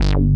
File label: FAT SYN BS; Pro-Rec - Synthsonic; Z1 TECHNO